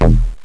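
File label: FM Moog Thud Bass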